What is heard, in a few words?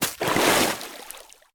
creature baby penguin jump to water